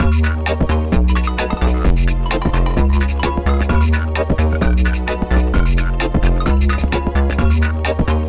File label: win; chibi robo photo finder; Smoglings win kitchen